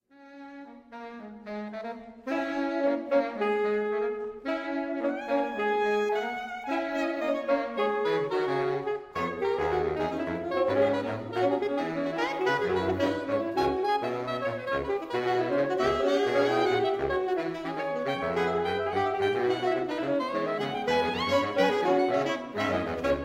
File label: Saxophone Quartet